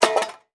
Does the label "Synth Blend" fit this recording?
No